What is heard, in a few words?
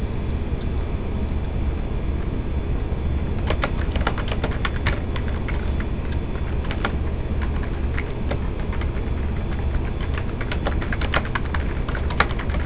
keystrokes